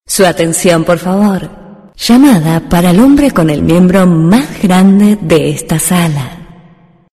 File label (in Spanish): azafata llamada miembro